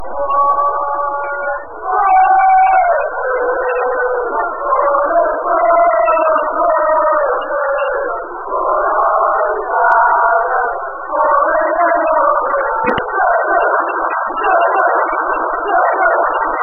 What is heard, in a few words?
wise men say, chants